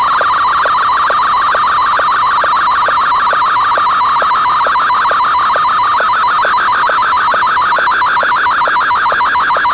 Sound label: sstv